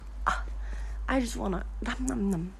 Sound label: nham nham nham